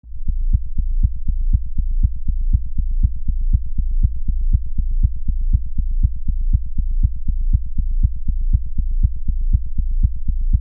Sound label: Helicopter Slow Motion Fast Heartbeat, Slow Motion Helicopter Surreal Fast Heartbeat; Design Warfare Combat; Muted; Choppers